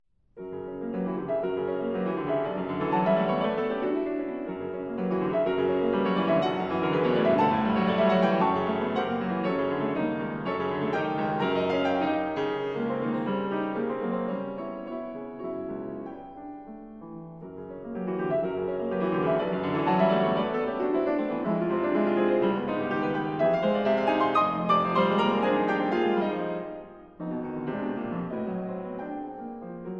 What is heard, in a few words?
Preludes, Allegro Con Moto, Piano; 20th Century American Piano Music